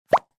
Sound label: appear; puzzleweave